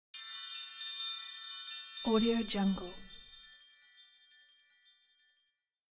magical holiday wind chimes

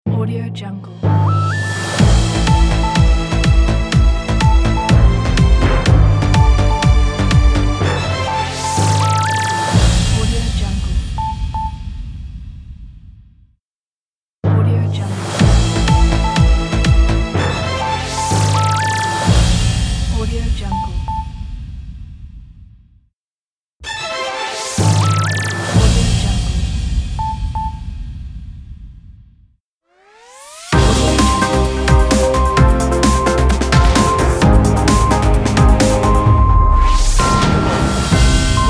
news music logo pack

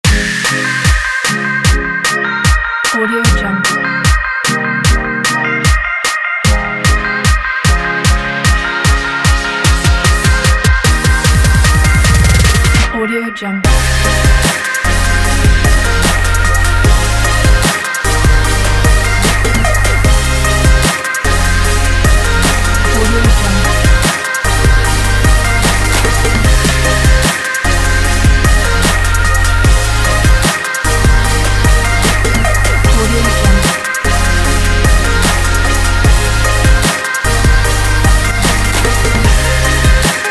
commercial happy future bass

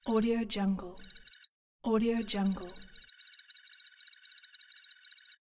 digital text

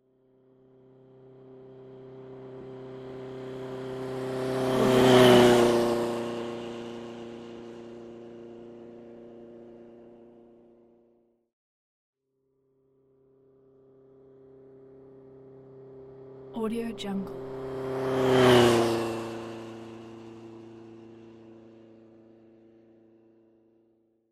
sound motorcycle on track

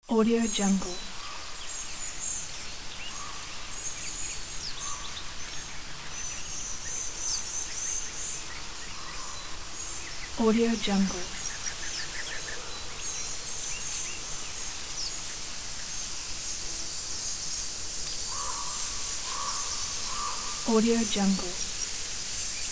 forest

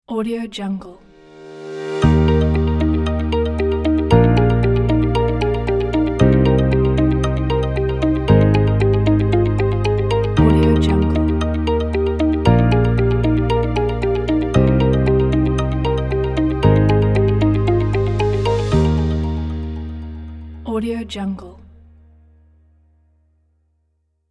uplifting corporate logo

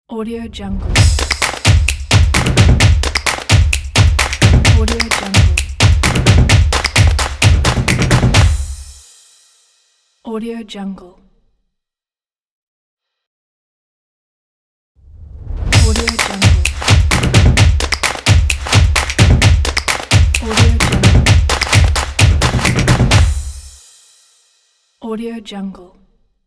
action clap logo

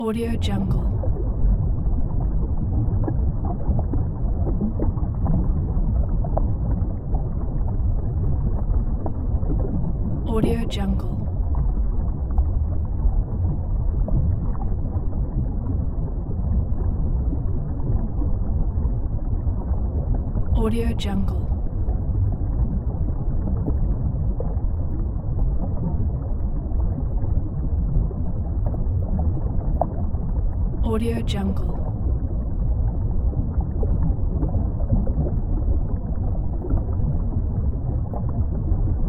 underwater ambience; blacklionproductions

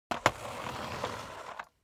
object household vacuum sweep forward long

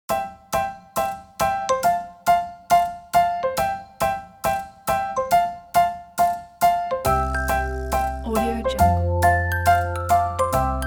happy bright fun

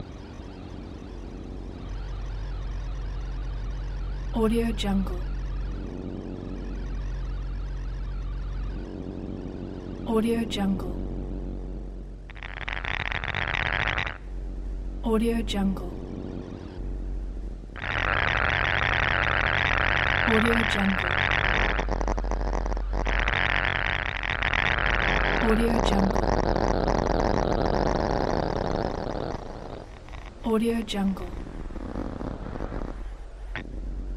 scifi galactic radio noise signal loop

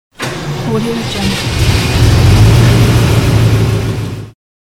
engine start

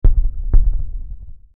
sonic boom

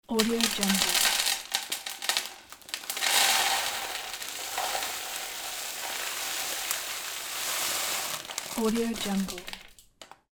throwing sea salt into a basin

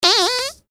thin human fart

